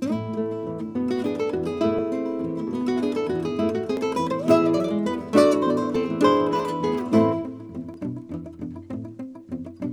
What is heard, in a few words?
guitar